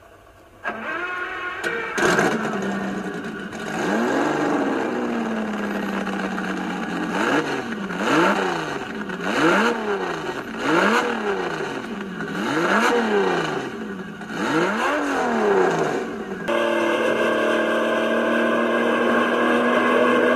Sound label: ferrari 250; garage